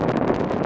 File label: Flame Emitter; Playable Tutorial Level